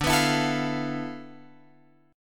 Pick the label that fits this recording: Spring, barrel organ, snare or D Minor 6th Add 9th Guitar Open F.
D Minor 6th Add 9th Guitar Open F